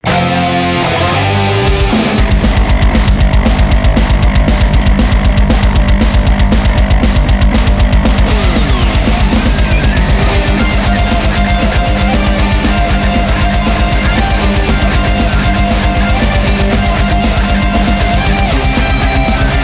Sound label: Turbo Tunnel; Battletoads